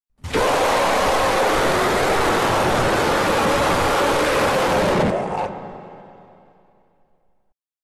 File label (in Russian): cartoon dog ispug